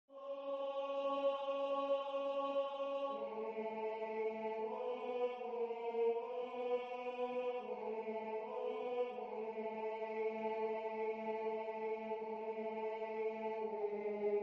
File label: tenor